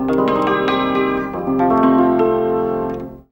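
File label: ZIMBAL MELODY; Best Service; World Colours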